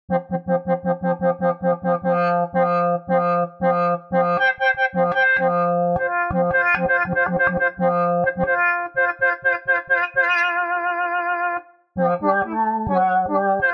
String Studio VS3

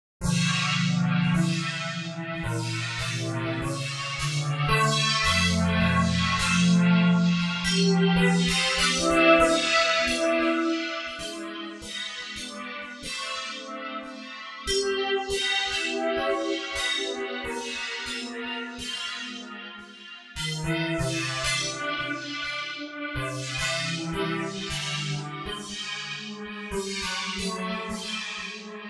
String Studio

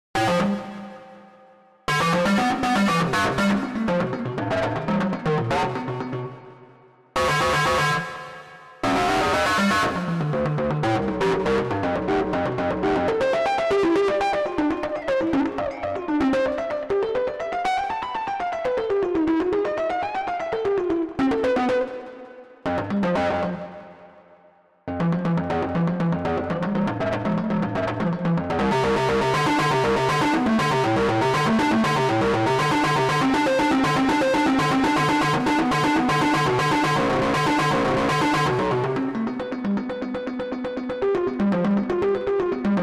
String Studio VS3

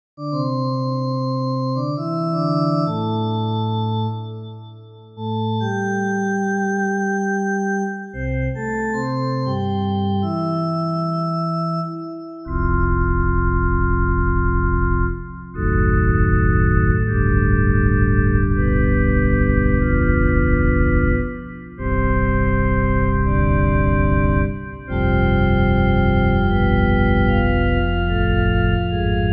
Ultra Analog

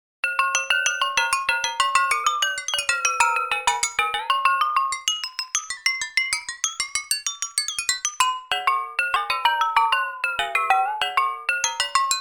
World Percussion

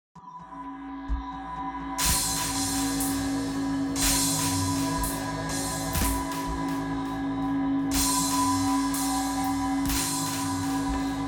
Groove Agent 5

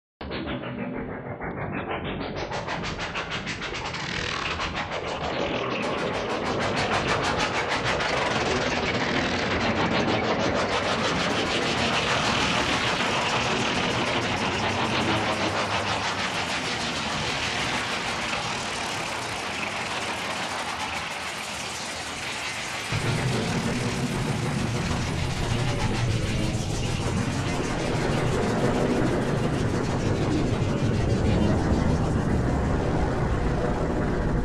Padshop 2

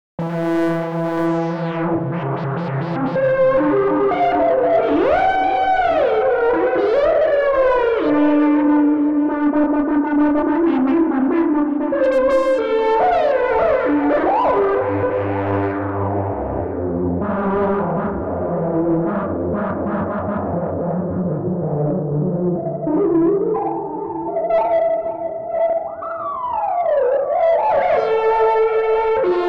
Synthi V

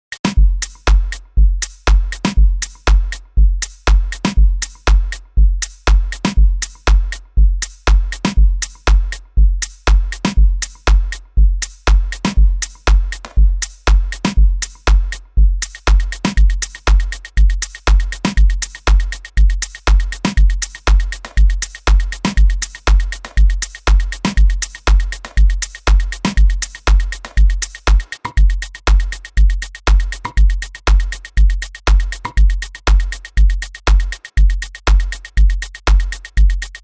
Groove Agent 4

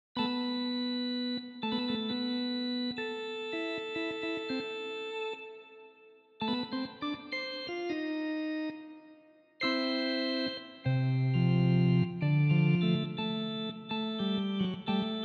Vox Continental V

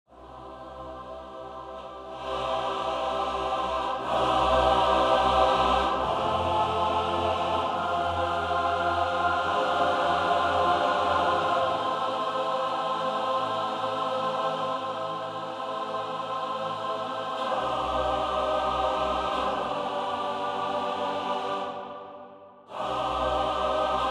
Olympus Choir Micro

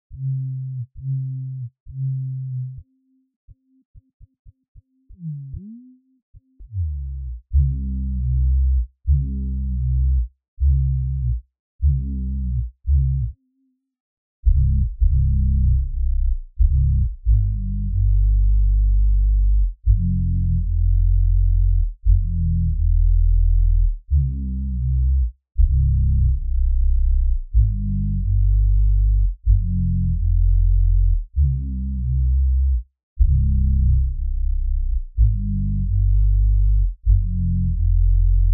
String Studio VS3

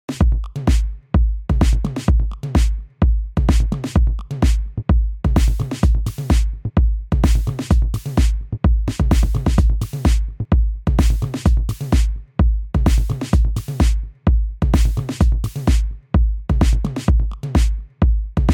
BBox

